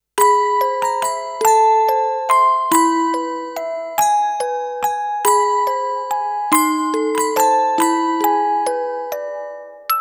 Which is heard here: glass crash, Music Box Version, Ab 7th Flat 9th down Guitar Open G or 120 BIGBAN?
Music Box Version